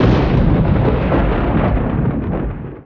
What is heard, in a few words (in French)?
Tonnerre